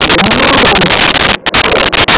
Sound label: vox bantha